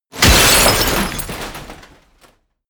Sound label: smash, baugewerbe